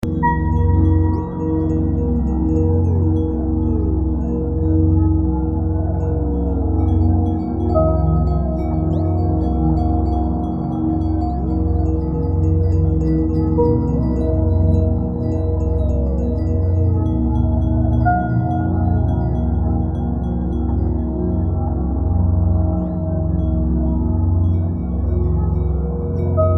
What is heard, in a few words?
Entrance Drone; Nexus Tower